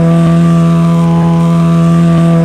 off mid ex, Engine; rTec Engine

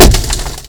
impact wall very light; Kart

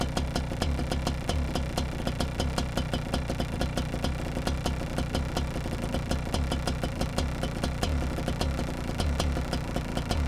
KART EX IDLE

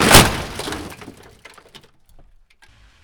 impact wall medium; Kart; AOS RF1 Kart Demo

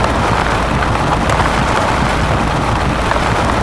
grass roll ext, Kart Tires; AOS RF1 Kart Demo

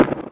gear1 shift up ex, Kart

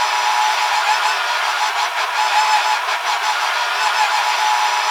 Trans on low, Kart

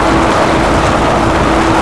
grass skid ext wet, Kart Tires; AOS RF1 Kart Demo

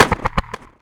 rumble short ex, Kart

